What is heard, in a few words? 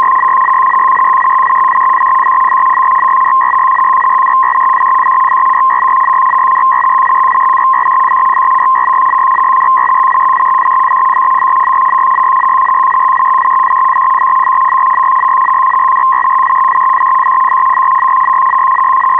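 DUP-ARQ-II FSK system 125 idle; 125 Bd system in idle condition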